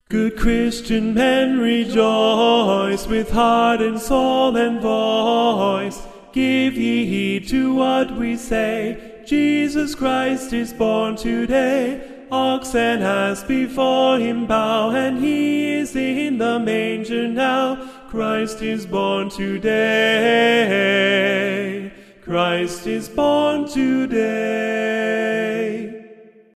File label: good christian men tenor